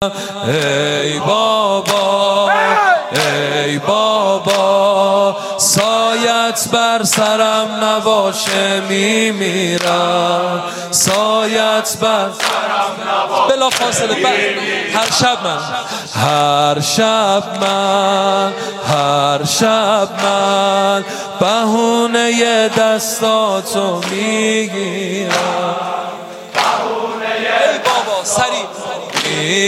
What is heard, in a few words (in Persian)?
ای بابا، ای بابا, مداحی, سینه زنی; محرم